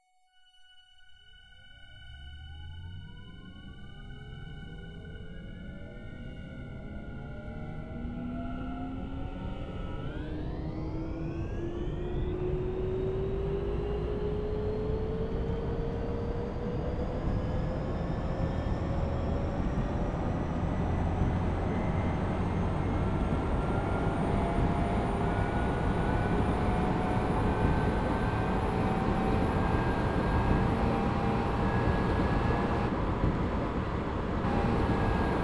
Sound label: motor noise; toq 5000